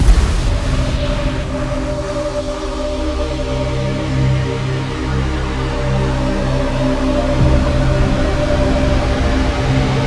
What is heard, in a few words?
Fill Riser 8 bar B; Megaton Rainfall; PS4